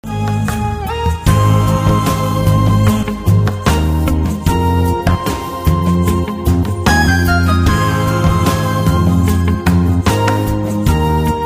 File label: xhosa chant